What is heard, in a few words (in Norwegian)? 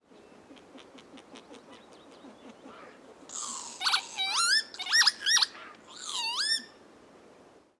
vipe; ytterneset